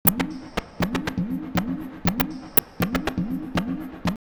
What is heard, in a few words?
120, drumloops, DrumMachines; X-Static Goldmine